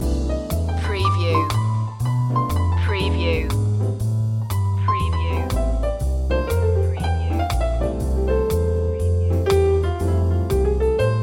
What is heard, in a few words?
coctail groove loop